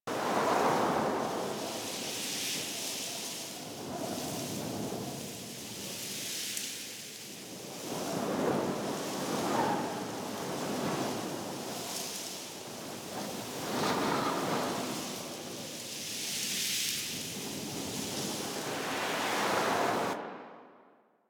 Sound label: Wind Grass Small Loop; ambience; props; Eidolon; Warframe; Eidolon Plains